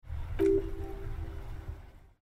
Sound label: intellect skill theme